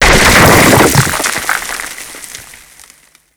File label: ceiling rock fall break; ModernWarfare